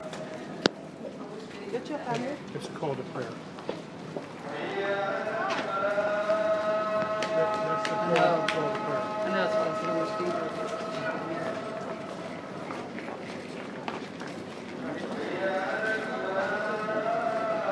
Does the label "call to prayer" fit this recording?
Yes